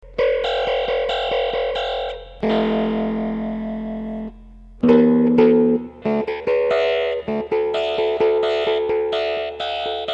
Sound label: zombie ring mod